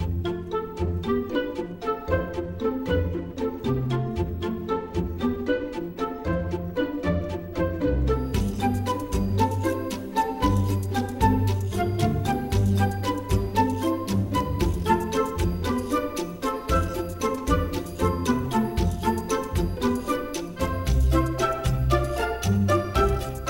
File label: load loop; The Sims 3; Windows